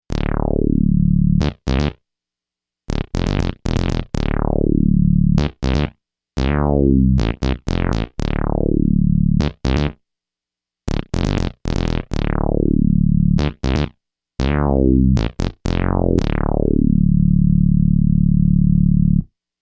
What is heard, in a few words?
reso bass